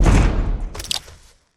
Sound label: hedgehog smash; destructibles